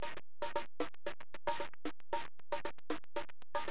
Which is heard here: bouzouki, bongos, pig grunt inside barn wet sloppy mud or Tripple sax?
bongos